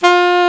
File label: SAX A. MP F, Alto Short; Roland LCDP07 Super Sax